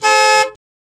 car alarm; vehicles

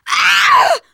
hurt critical, teengirl; player; survivor; voice

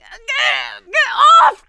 scream while pounced, survivor voice; producer

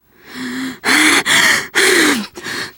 exertion critical, teen girl; player; survivor; voice

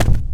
plaster, footsteps infected run

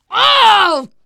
negative noise, teengirl; player; survivor; voice

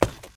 tile, footsteps infected walk; player

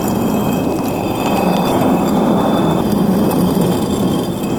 concrete scrape smooth loop; physics, concrete; Left 4 Dead 2; Left4dead 2 2013

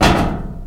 metal grate, footsteps infected run

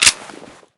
auto shotgun bolt forward; weapons, gunother

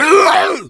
hunter pain short

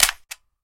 smg clip locked; weapons

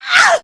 rage, infected, female

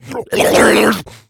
rage, infected, male

